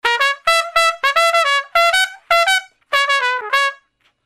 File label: brass loop